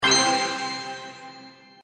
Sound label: ding